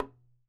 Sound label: Violin, Instruments; Convolution; IR Samples; NI Kontakt 5